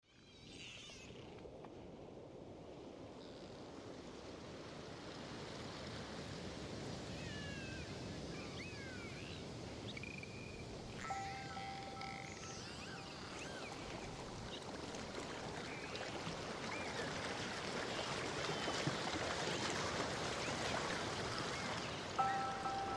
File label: dolphin prelude